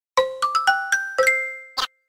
startup; flipnote

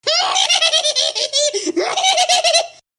wuhahahah

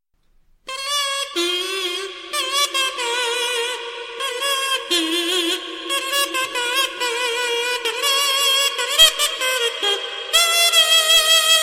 kazoo echo

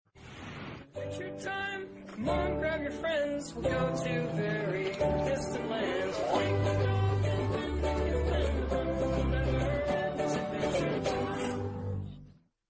theme song ringtone; adventure time

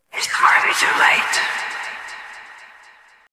horror mode party club

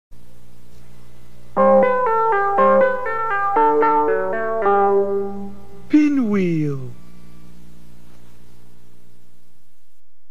pinwheel bumper

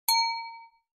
mac os x glass sound

